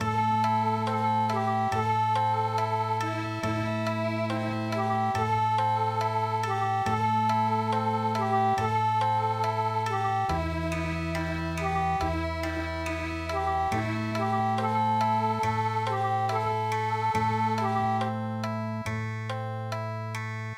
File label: Okinawa